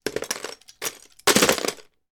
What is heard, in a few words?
toy lego blocks dropped med in full box; sound effects one